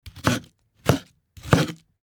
Cutting vegetables with knife chopping board

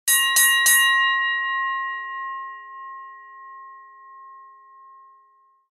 Boxing bell ring three times

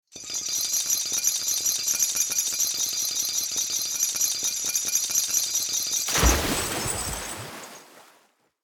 Ship anchor release water impact

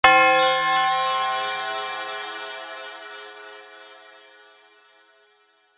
Christmas bell hit jingle bells ringing magical

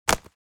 Leather wallet falling and impact; foley

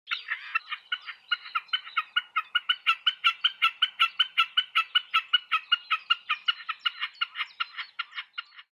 Common snipe singing in nature

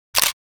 Camera shutter click taking picture

